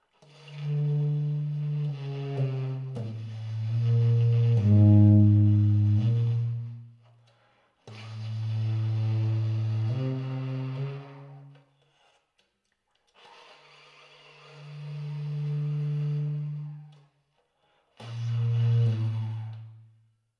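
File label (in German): ten, Subtone, Subtone als pp-Spiel im tiefen Register. Tenor; saxophon; spieltechniken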